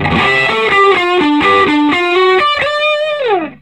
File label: SOLO, Vintage Blues Guitar